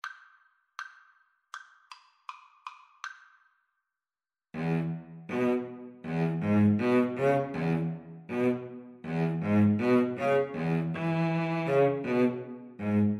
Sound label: Cello Duet